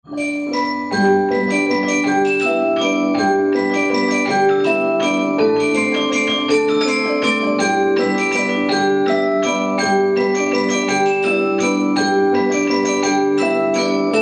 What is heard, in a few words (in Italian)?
Suonata, campanari